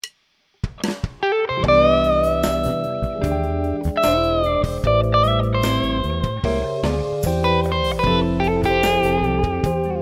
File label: neo soul solo, guitar